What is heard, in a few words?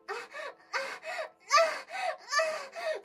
mmmm